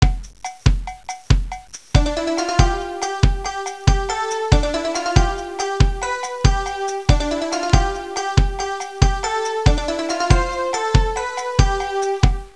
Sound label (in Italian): suonerie musica ritmica; rhythm